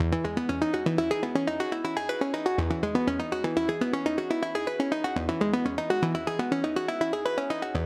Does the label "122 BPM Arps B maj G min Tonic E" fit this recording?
Yes